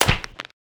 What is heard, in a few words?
Break Bone Heavy; Osteotic Bypass